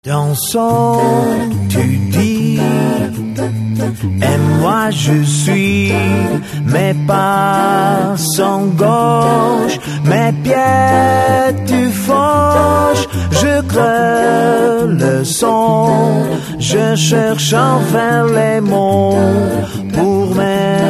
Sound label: jazz